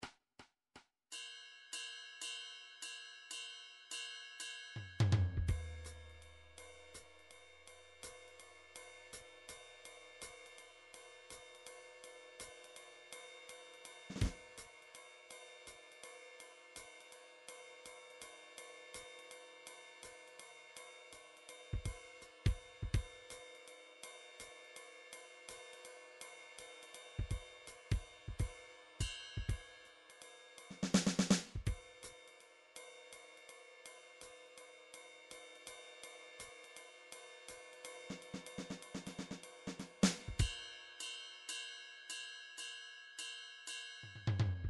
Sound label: odd meters; Drumtrax Library 2